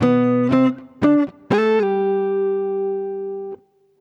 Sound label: Oktave, джаз